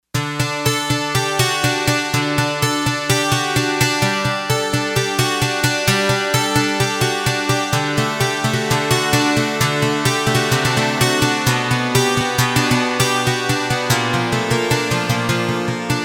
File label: 12 String Guitar, Yamaha TX802; Performances; Factory Patches